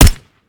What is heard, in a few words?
mike4 sup, weapons; gmod; viper